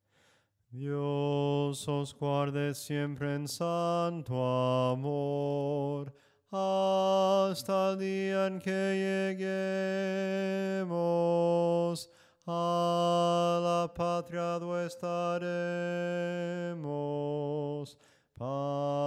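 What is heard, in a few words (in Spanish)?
SOPRANO CANTADO, himnos en melodia soprano; DIOS OS GUARDE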